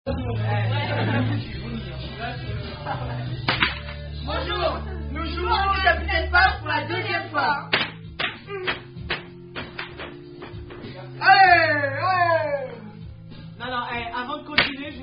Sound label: French Version Are You A Cardinal Drinking Game; Capitaine Paf 1